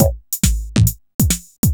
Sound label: Beat, Drums & Percussion; Electrocution; Beats